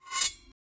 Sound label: metal pole slide down fri